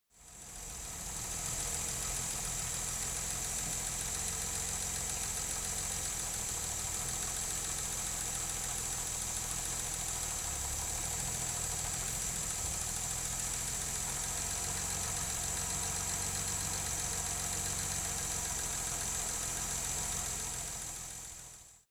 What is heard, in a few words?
car jaguar e-type idle engine